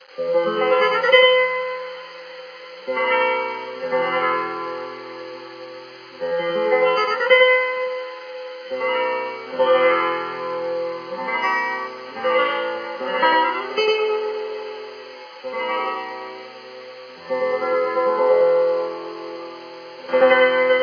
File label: flamenco; inferno go